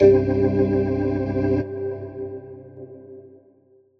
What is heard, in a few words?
organ